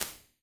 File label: Snare Chamber, impulse responses, small spaces, rooms; Apple